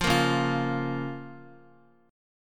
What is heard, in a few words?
Db 6th, Guitar; Db6 strummed; JGuitar